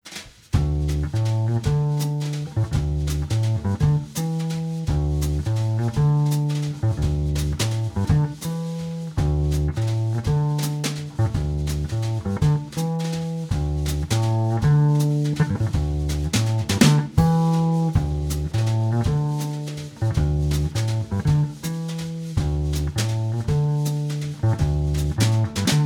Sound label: ~Rhythm Arrange Ver.~; pp pianissimo ayatsuri ningyou no rinbu original sound track tonality